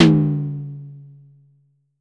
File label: Drum X Tom; Pearl Drum-X